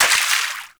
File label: Water Sprayer Surface Impact; Warframe; Weapons